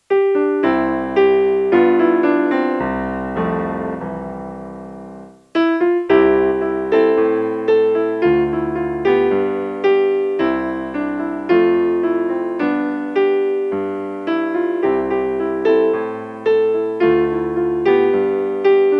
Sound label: Kids Music Sample Accompaniment